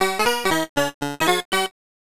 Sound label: Round Clear